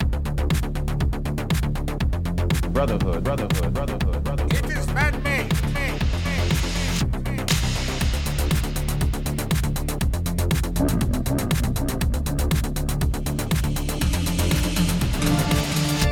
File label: Disco